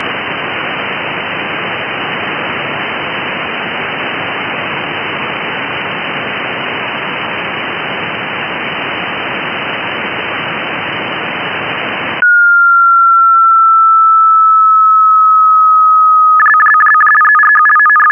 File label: 433.704 MHz USB